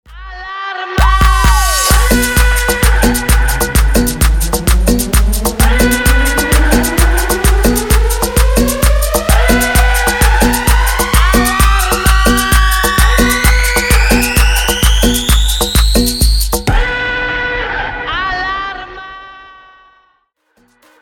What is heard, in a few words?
130 BPM